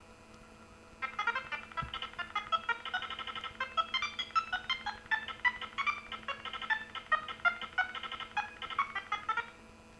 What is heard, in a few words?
Baidu With One Note; dom sonification